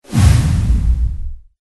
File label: deep fast whoosh; Good Times Memes; Sibs